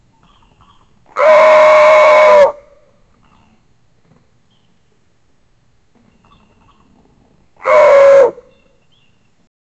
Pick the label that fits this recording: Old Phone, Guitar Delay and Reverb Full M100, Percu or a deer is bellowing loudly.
a deer is bellowing loudly